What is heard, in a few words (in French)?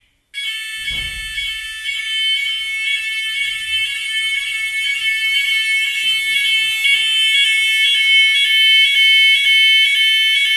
Alarme incendie